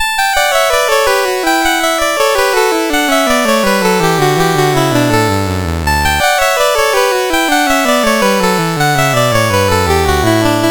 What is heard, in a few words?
Rondeau, CSG8580R5